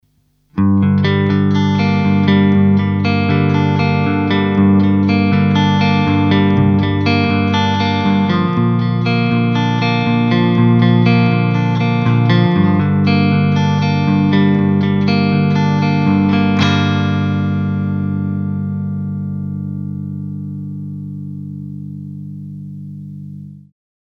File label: clean; Sub-Pre; new amp; eclipse